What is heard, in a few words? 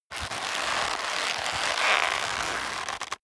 leather creak long hard